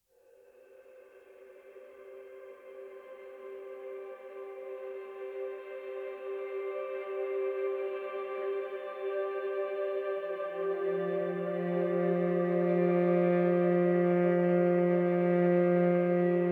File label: Jazz Music Therapy For Cats, Calm Waves